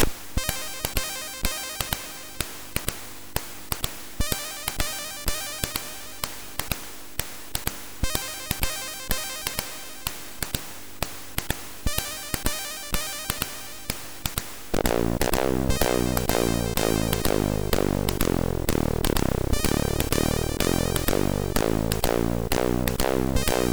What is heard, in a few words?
Nightjazz, MOS6581R3; hvsc